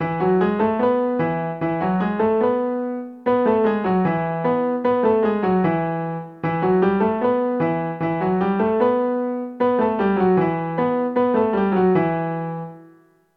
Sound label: 16th note in E and Em